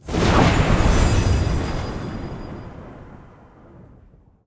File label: intro Splash; parthenon